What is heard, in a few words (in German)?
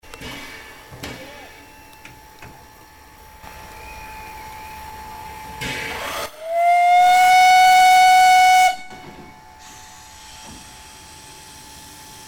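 Dampfzug fährt los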